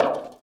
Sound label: bleacher step; Golden Crayon Sounds